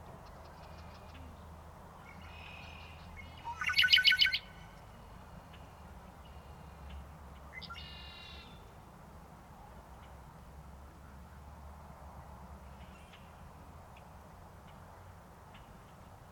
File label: Red winged Blackbird Agelaius phoeniceus